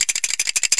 Shaking

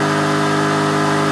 Engine Jeep Rpm High; kart racing; Boom Karts; Android

